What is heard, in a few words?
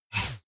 whoosh metallic synth short